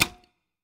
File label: Lock; Meitu; V4s